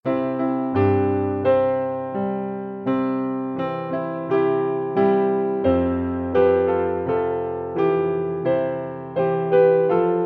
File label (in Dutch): Rijden maar 2e stem